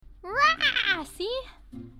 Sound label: raah